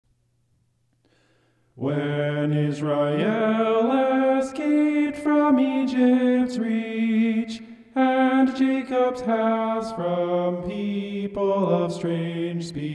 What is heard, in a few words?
vocal homoph; psalm 114